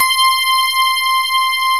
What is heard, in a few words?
PF C 5 -L, GRAND PF